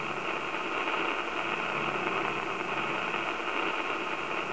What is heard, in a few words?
radio, ambience